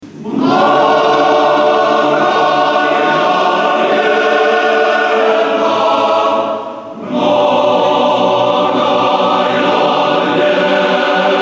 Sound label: male choir, mnogayaleta; male choir of mephi; tsaritsyno 16.10.2005